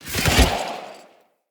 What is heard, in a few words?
Cannonball Fly Down; Bromius